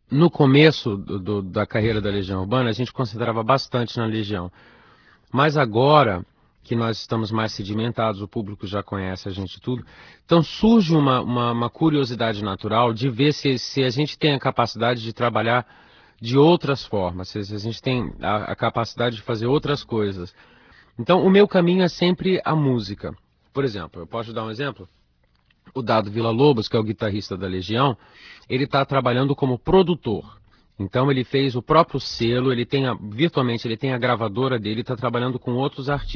solo

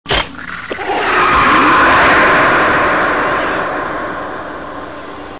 wound; seals its wound and roars into the jungle; Predator

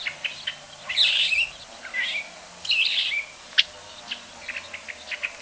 redwing

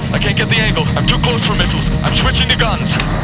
guns

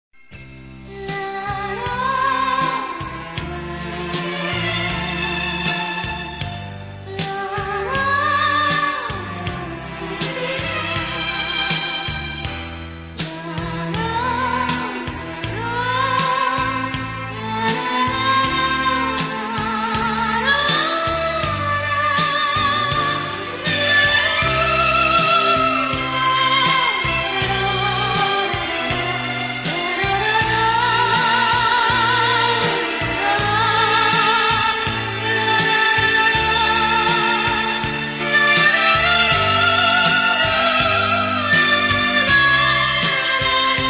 lullaby

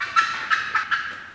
cackle

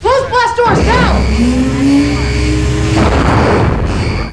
blast door; IVANOVA-CLOSE BLAST DOORS

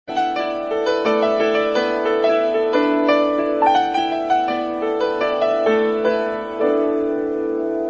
melody

